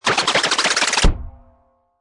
Cartoon Mix, Quick twirling, spinning and fall down bonk; Comedy/Cartoon CARTOON MIX; Funny Sound Sound Effects; Lynne Music